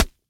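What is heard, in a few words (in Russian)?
приглушенный одиночный выстрел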